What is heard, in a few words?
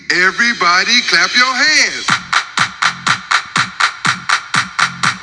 cha cha